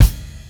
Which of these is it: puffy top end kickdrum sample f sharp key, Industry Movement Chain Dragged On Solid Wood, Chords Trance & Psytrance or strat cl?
puffy top end kickdrum sample f sharp key